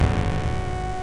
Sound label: electro; Aethelgard